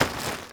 Trench Netting Destroy; Gameplay; Warframe; Tau; Destructible Dynamic

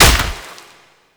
Proc Explosion; Warframe; Mods

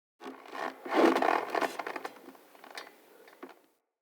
Tent Rope Creak, Interior; Soul Plane

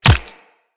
Player Bullet Impact Flesh; Weapons; Bullet Impacts

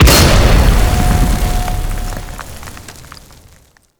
Heavy Slam Ability Slam; Warframe; Duviri; Dax Heavy; Abilities

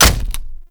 Punch Impact Flesh; Warframe; Infested; Kick Punch

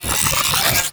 Lightning Stomp Cast; Warframe; The New War; Archon; Boreal; Abilities

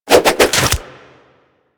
Nunchuck Quick Melee Return; Warframe; Infested

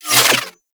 Hack Interaction Out; Parazon

